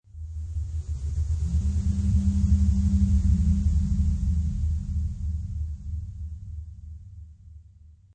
Ectoplasm Ghostly Presence, Ghost Sound Effects

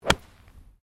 GOLF DRIVE IRON; Various Golf sounds; Sports

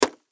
Axe Chop Windshield Crackle Fire Department; Emergency

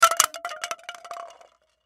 Cups Plastic Drop, Cups Plastic Drop Childrens; Household; Cups Plastic Drop Multiple Childrens; Dishware

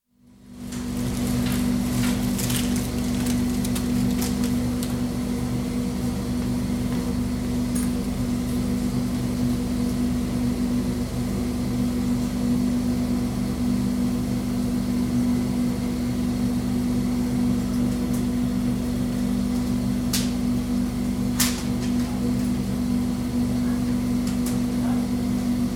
Hospital research laboratory atmosphere; Hospital Sound Effects; BBC

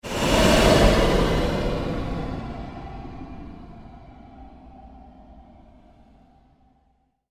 Created Surge Long Sweep, Sweeper Sound Effects; Sound effects created by manipulating other sounds

